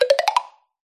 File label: cartoon wood taps knocks ascending; zapsplat